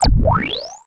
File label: Pickup Scifi Health; SFX Kit